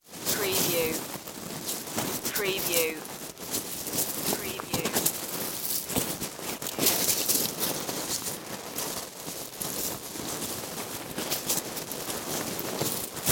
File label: burrow and rustle